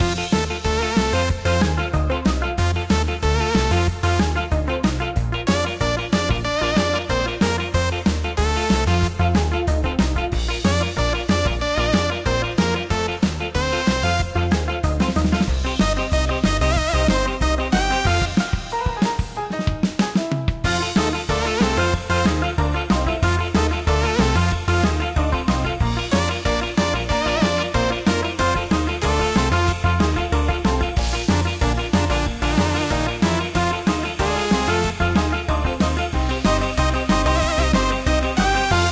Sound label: BGM in game; SpaceTrain